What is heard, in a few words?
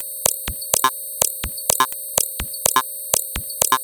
125bpm, House Drumloops